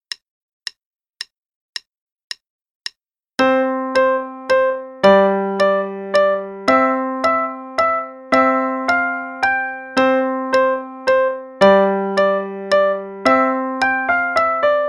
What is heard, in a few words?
English Minuet (st part, qn=110); Faber Adult Accerlated; Adult Accel Level 1